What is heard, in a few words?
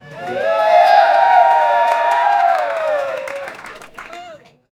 audience cheering